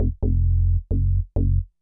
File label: bass, 132 Fmin, Hardcore Breakbeat; Big Fish Audio; Hardcore Breakbeat Action; Warned